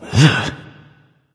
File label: "ugh"; smite; voicepack; ullr